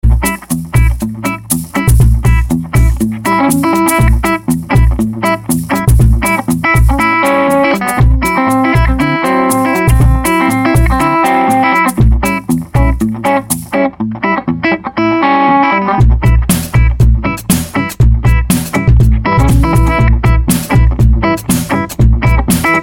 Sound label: Country Jazz